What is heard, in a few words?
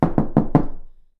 knock on house wall; knocking